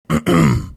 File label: throat clearing